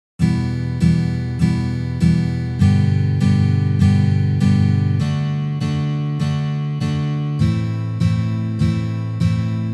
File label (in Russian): Uprazhneniya dlya igry akkorda Mi minor na gitare